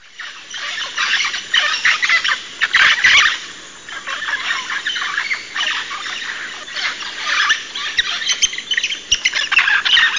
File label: amazona farinosa